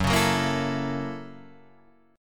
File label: F sharp 7th Sharp 9th, Guitar, Standard; strummed; JGuitar